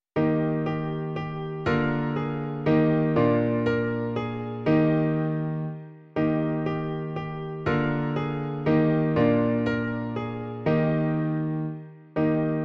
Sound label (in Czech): klavír; Klavírní verze